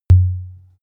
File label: wood impact soft deep tonal